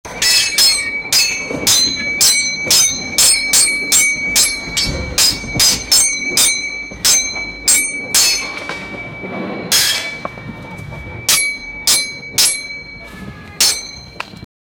battle of armies swords h

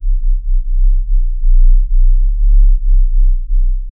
a smooth deep bass

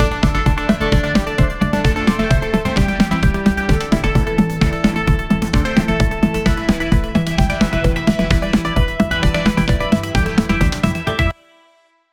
modern catchy audi, audio logo

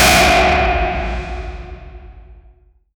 sound effect sfx horror

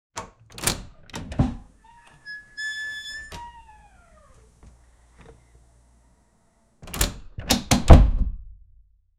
automatic door open close

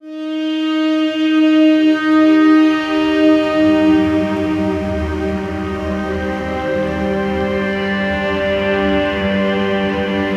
cinematic orchestral back

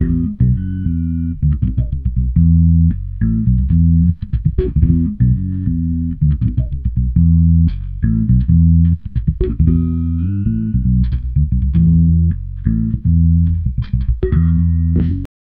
fender jazz, bass guitar

